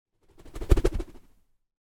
leisure toy boomerang pass whoosh

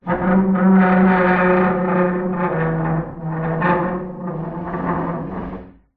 foley metal scrape large heavy; zapsplat

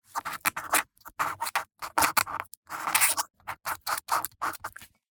Handwriting Quill; soundbits

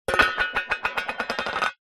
foley metal money tin lid down spin on table; zapsplat

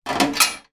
foley metal small object thin down; zapsplat

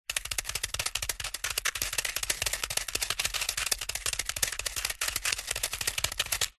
insect spider large run scuttle on stones gravel; animals

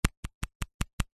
hospital syringe tap finger

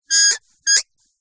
leisure toy squeaky squeak broken

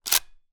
gun arisaka; still north media

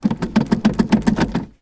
gearstick side to side neutral wobble

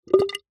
water gurgles short weak; nature

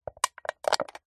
foley drinks can very light crush handling empty

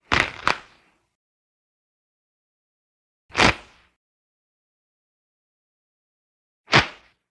animals drop box of dog food on floor multiple; sound effects one

